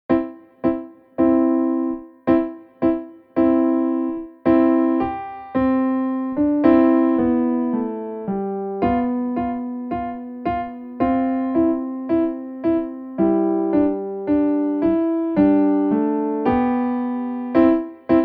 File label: Jingle Bells